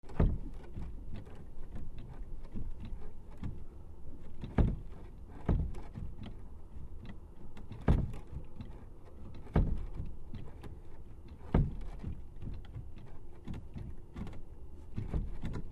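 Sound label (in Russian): ветер хлопает дверью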